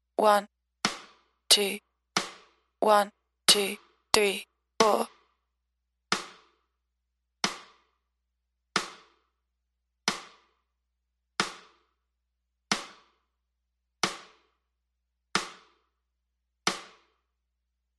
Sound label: metronome 2 count offbeat 3